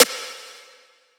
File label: Tough Snare; Sample God